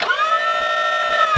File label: pain; alienarena; war